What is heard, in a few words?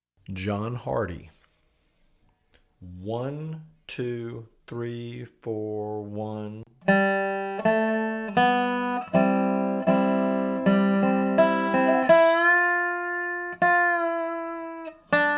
Slow; John Hardy